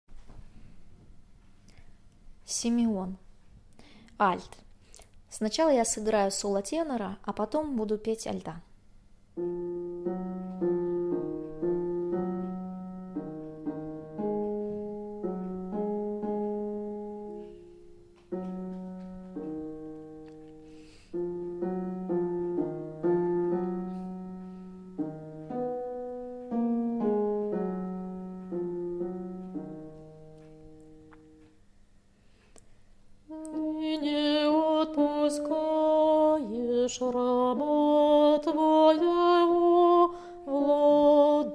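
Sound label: alto; Simeon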